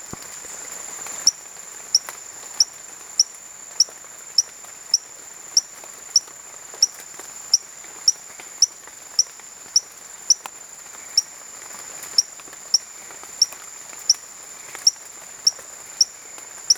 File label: Allobates sp. aff. tapajos; ECourtois